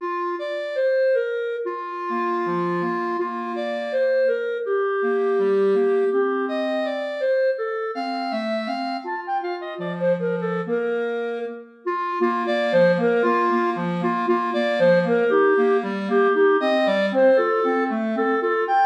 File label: jingle bells